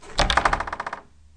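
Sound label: Door Close Click Rattle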